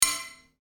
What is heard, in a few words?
Clank